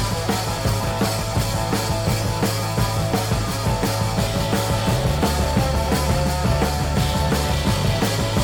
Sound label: Rock n Roll